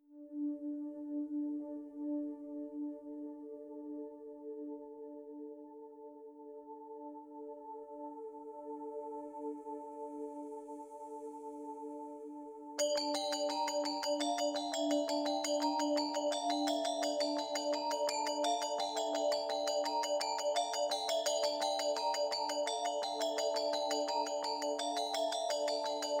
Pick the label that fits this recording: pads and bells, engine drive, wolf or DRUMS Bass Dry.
pads and bells